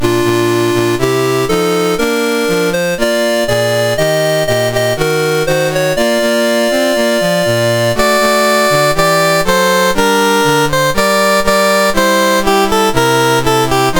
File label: Hail Perdue, University Fight Songs; MOS6581R4; Dave Marquis